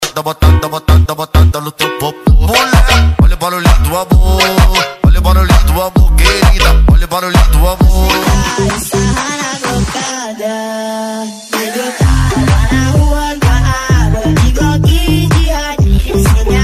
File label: mega funk